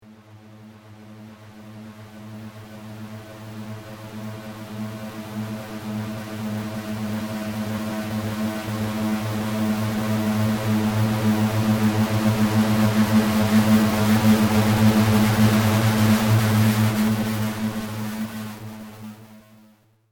Killer Laser Build Up, laser gun sound effects

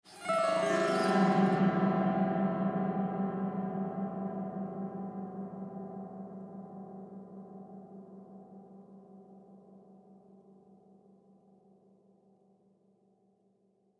Cartoon Autoharp Strum Scale Down Musical

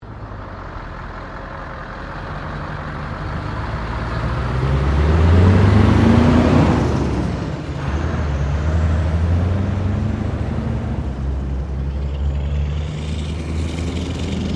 Traffic Semi Trailer Drive Off; General traffic sounds; Traffic and Cars; SoundEffects-Foundation4

